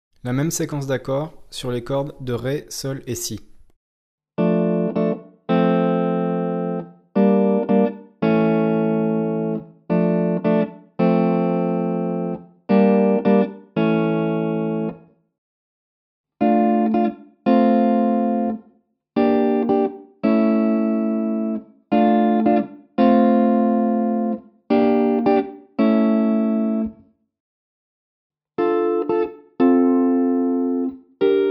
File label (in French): triades